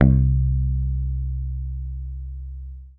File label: HOF SUS, Hofner bass; Sampleheads; Will Lee Bass Library; HOFNER MUTE